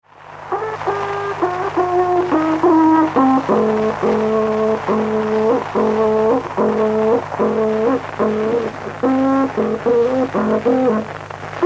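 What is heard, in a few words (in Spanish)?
Toque de trutruka, Mapuche; Música Mapuche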